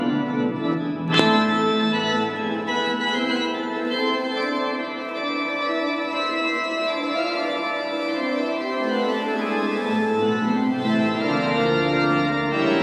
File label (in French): Orgues